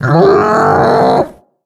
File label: die; The Monster Plane; kolmods death